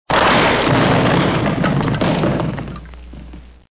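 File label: loud sound of falling and smashing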